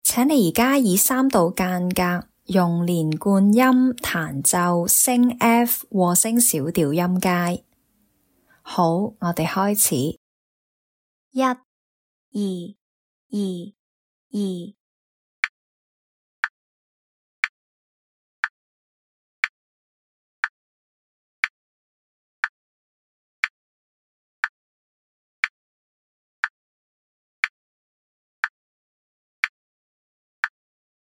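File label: F sharp minor harmonic scale a 3rd apart legato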